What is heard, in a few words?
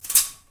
peek through blinds